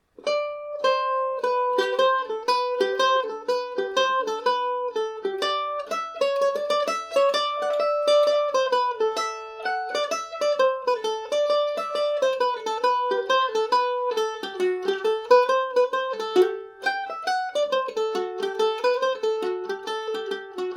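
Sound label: ace and deuce of pipering G major, mandolin; Revelation Jaguar electric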